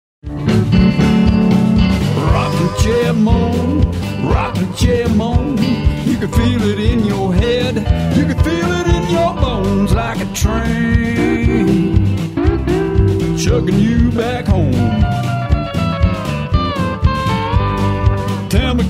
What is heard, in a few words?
Rockin' Chair Moan, Blues